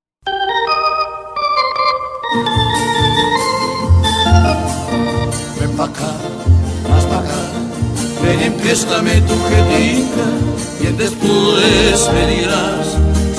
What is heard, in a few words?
bambuco